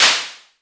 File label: dirt snare; kidGlitch; Ableton Foundation FreePacks; Wicked Kits